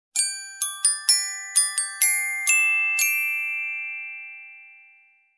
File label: Sweet mallet lullaby melody